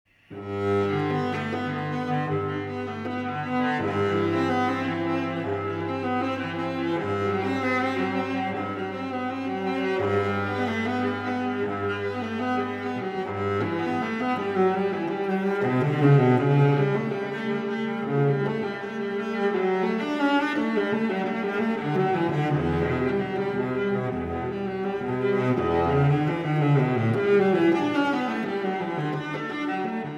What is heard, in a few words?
cello